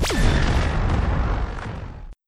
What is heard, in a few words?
beam start effect